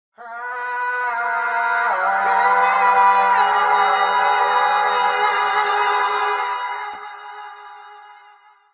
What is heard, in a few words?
Horror message tone